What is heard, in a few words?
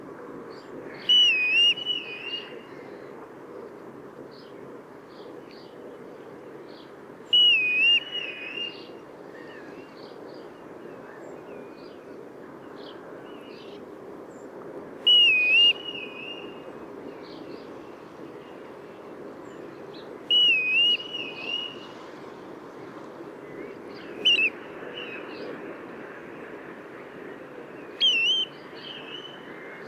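grey plover, flight call; goulven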